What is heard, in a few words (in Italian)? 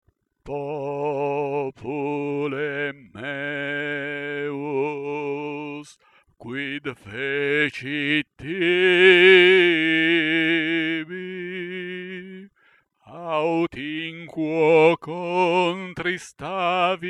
Popule meus - Contralti; Monteveglio